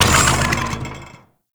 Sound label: Lift Move Stop; Warframe; Duviri